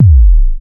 subtle reverb kick drum sample a key, steel kick drum sound; kick drum samples